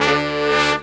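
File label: brass shorts, Brass Sections, Horns; R&R Sect; Roland LCDP06 Brass Sections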